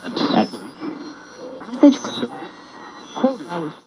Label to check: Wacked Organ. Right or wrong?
Wrong